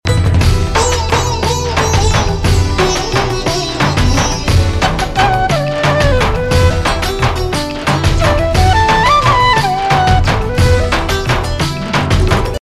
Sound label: Music Telugu Ringtone